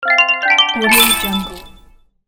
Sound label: coin sfx